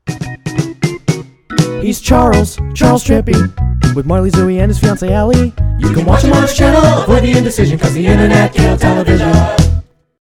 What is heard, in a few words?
Upbeat